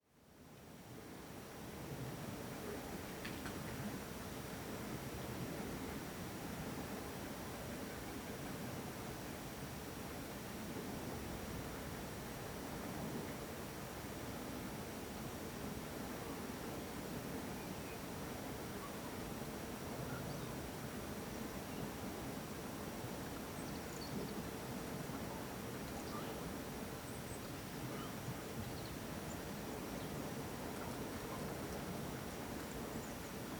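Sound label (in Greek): Κύρια πύλη φυλακών Χειμώνας; Κύρια πύλη φυλακών 1 Χειμώνας (ΗΧ); Fulakes mprosta ORTF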